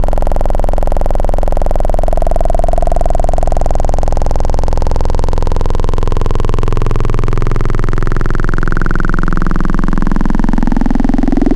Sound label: Digital Formantish; Synthetic's Serum Collection; ESW Core Wavetables; Echo Sound Works Core Tables